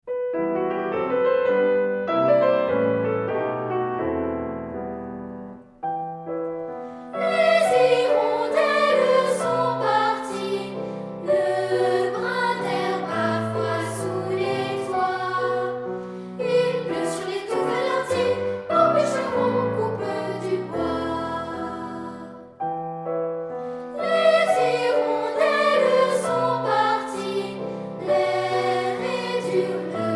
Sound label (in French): voix piano